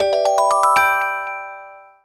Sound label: Hexagon Quest get Jingle; wii street u powered by google wii u gamerip 2013